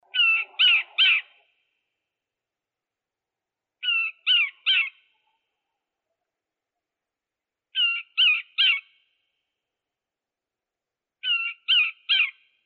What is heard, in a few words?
Micronesia King Fisher